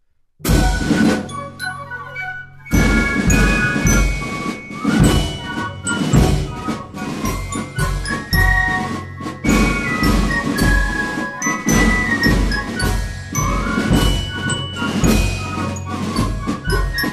Marsch

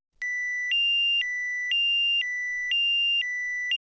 Alarmton; Aibus P8GR

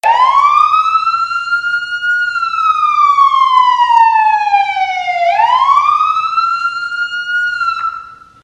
Wail Sirene

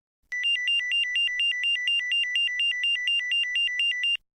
Alarmton; Aibus P8GR

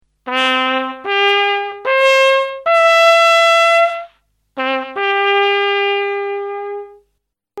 das ganze halt, Hornist

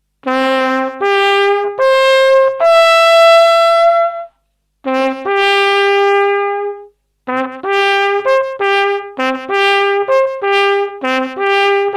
sammeln, Hornist

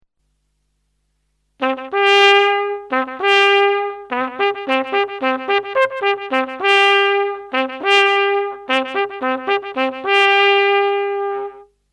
es brennt, Hornist

